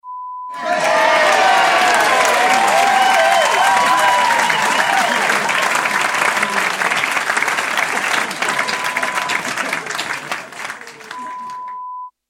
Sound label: Applause sound